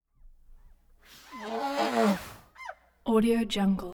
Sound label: elephant grumble; prosoundfx